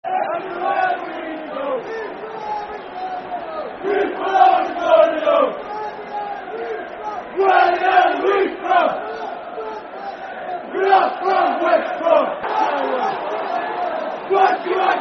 Everywhere We Go Football Chant (Soccer Song); Walsall Wfc

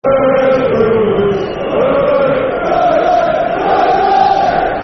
Rovers, Rovers, Football Chant; Bristol Rovers Brfc

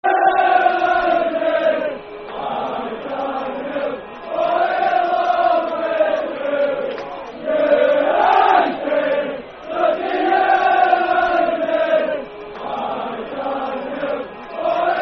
Football Chant; United, Dundee United; Dundee United Dufc

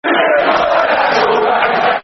Football Chant (Soccer Song); Charlton Athletic; fanchants-com

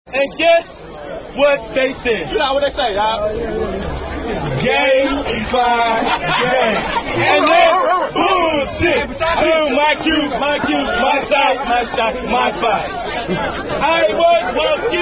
Chant; I Was Walking; Gay Phi Gay Chant; Omega Psi Phi Chant

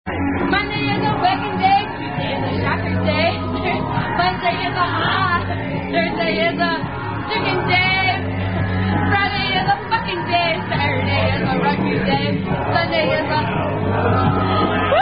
Rugby Chant

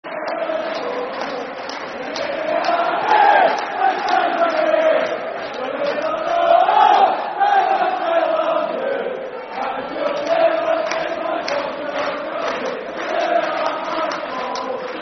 Football Chant (Soccer Songs); You Are My Falkirk; Falkirk Ffc

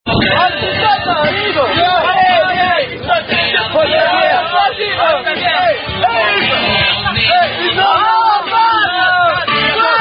French Rugby Tradition; Rugbyman Volant

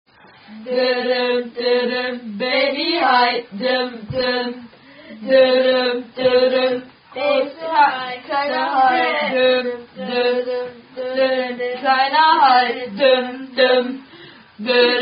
German Attack Song

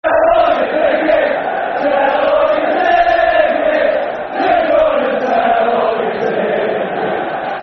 Football Chant (Soccer Song), Man United; Couldn't Sell All Your Tickets

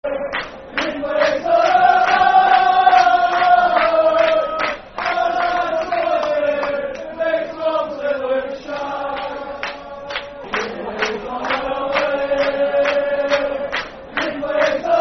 Football Chant, Dundee United; He Plays On The Left; Dundee United Dufc

